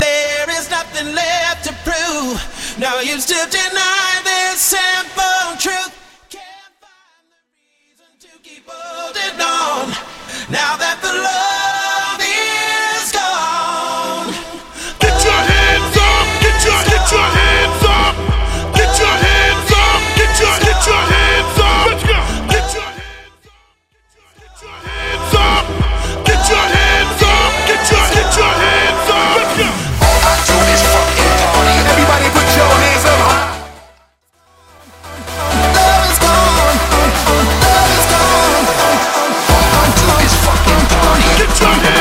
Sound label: Bootleg Festival Mix Acapella In Party Break 128 Bpm; Vegas Edit